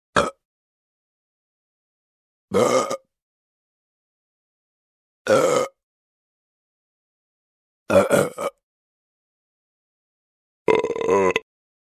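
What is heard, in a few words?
burping sounds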